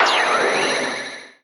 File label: Symbol Animation Wand; Mirror Magic; genesis